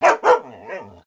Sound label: idle, dog